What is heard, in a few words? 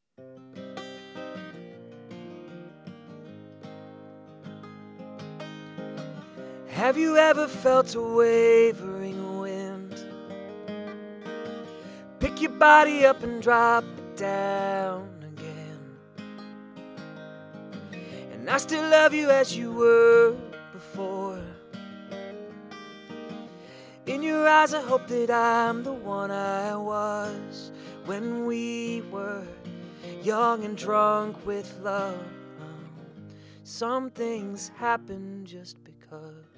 Music Rode Podcaster; usb mic